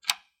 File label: deadbolt unlock; SoundsCrate